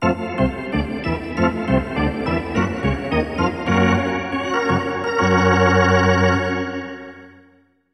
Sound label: Hockey Organ